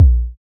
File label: Kick; Samplepack Produtique